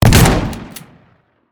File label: shotgun